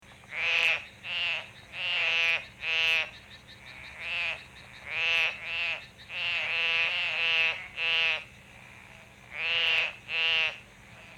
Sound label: Pelophylax Porosus brevipodus, OKAYAMA